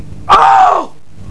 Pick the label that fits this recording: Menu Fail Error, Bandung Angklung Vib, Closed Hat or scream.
scream